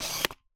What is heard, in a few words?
Camera Single